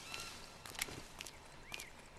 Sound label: enemy steps